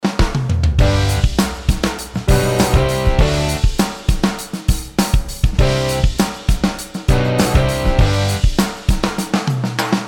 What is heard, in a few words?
POP